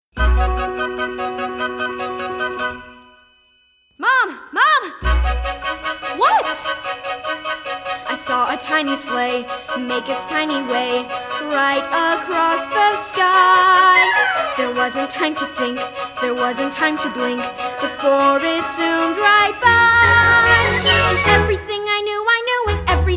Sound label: vocal